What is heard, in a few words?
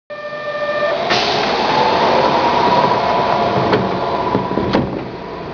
door, train; kyoto shiei